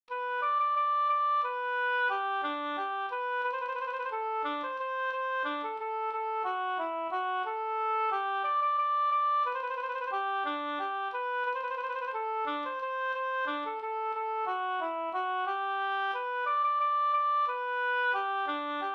vals

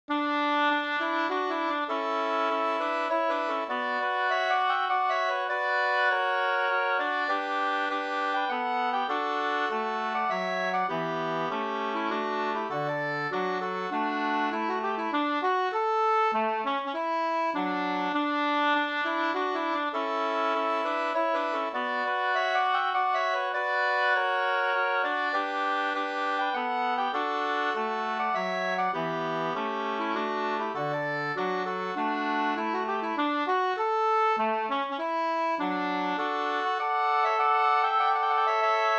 menuett, 3st; Melevetten SvL Sk168

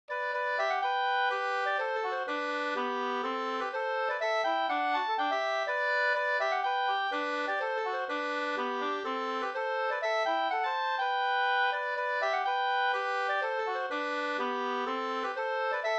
slangpolska 2st

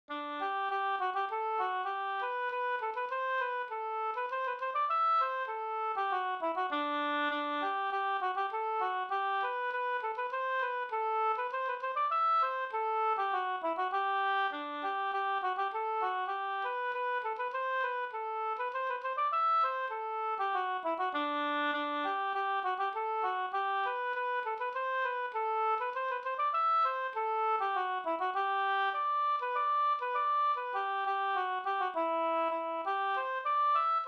slängpolska

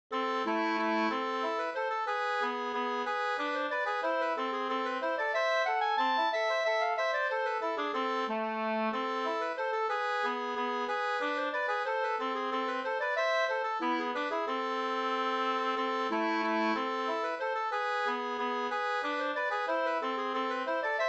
polska A moll

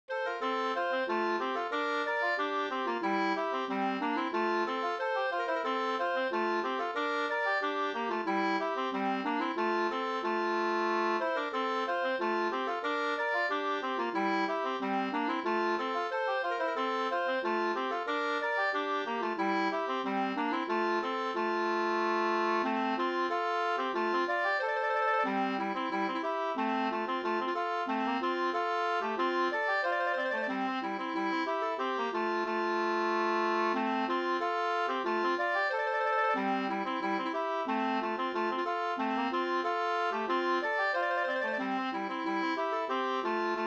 polka polkett

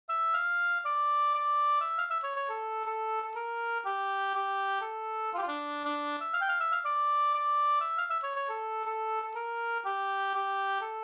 polska Bingsjopolska; Pekkos Pers brudlat e Pekkos Helmer

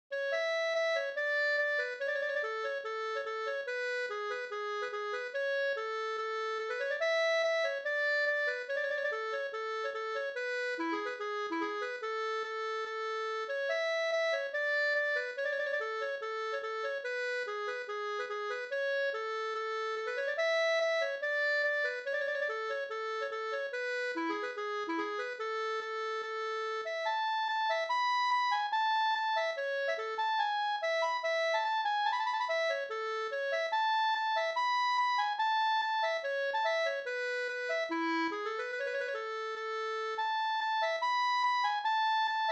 reinlender

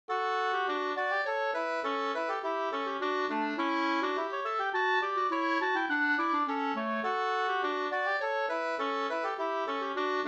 polska, 2st; Babba-Lisas polska Vb

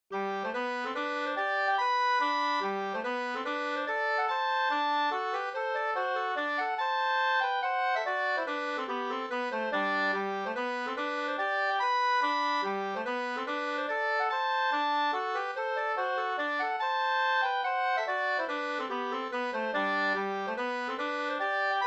langdans; fr Nk Linus Danielsson 2st

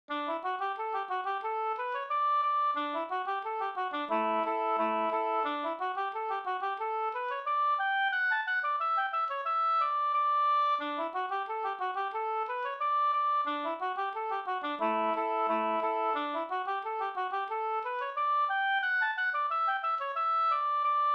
engelska; Valla

